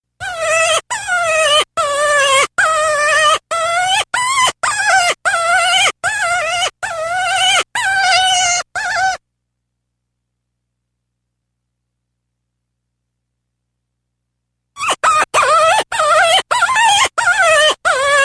Cottontail; Rabbit; ORION

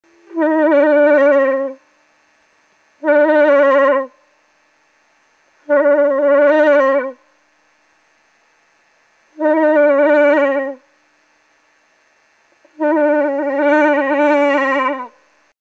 Cow In Heat Not Ready to Stand, Moose

